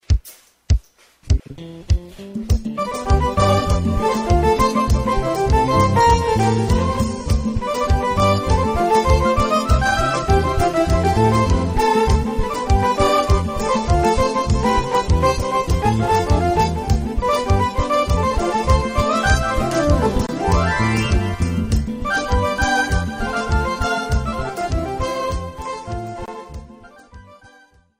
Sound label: Kompa Piano Solo